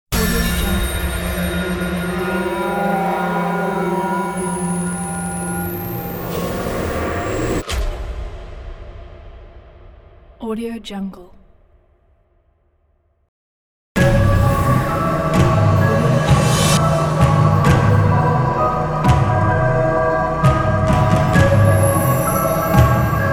horror riser logo pack

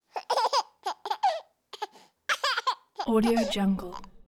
baby laughing; prosoundfx

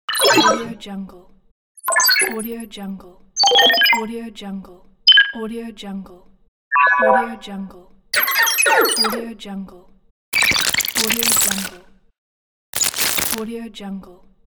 hud; fractaldimensions

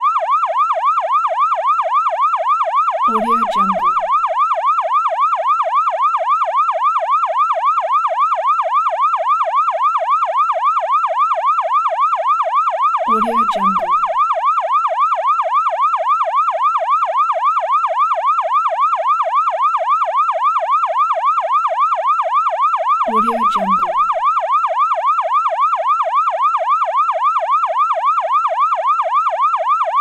fire truck siren

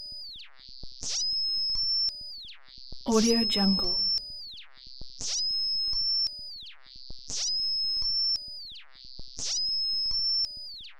alarm loops

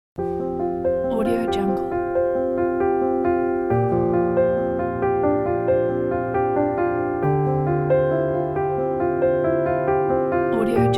inspiring emotional piano

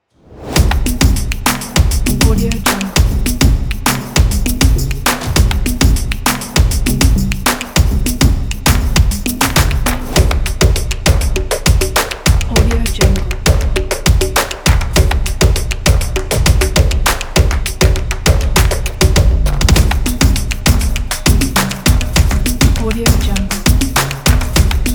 stomp percussion ethno

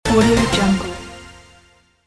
level complete

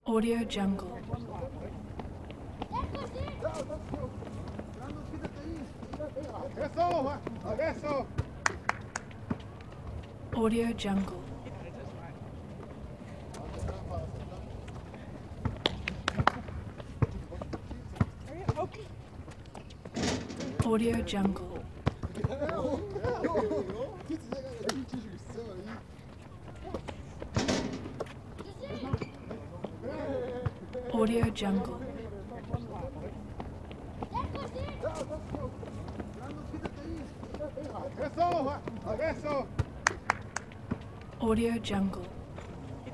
street basketball ambience

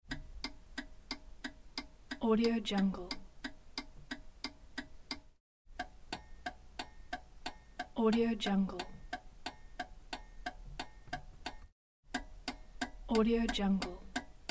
turn signal

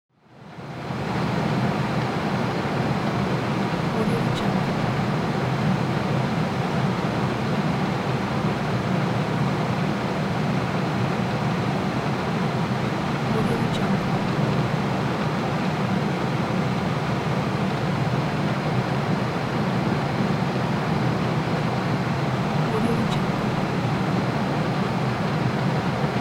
household air conditioner

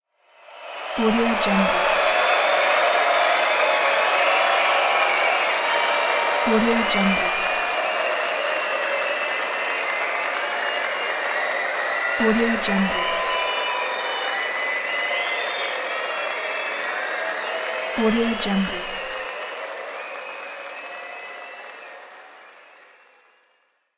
crowd cheer

